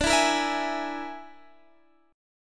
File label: Bb 7th Flat 5th D